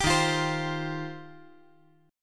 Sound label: D sharp 6th Add 9th